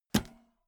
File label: bmx bike grab bar soft